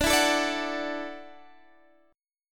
F sharp Minor 7th Sharp 5th D

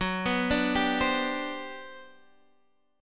G Major 11th F sharp

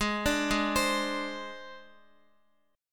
G sharp Major Flat 5th, Guitar; G#Mb5 arpeggiated; JGuitar

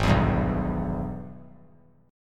G sharp Minor Major 7th Sharp 5th G sharp down; strummed; JGuitar

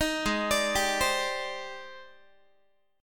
G sharp Major Sharp 11th, Guitar; G#M#11 Chord

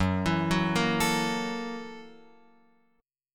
Gb 7th Sharp 9th Gb Guitar Open F; arpeggiated; Gb7#9; x,1,4,1,1,4; JGuitar

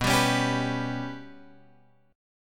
B Suspended 2nd Sharp 5th down Guitar Drop D

